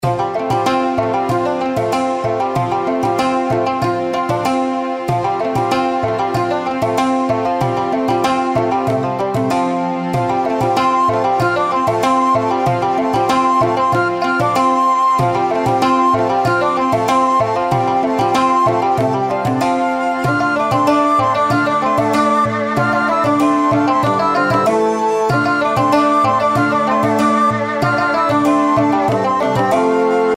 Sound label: reelspin fg; Journey To West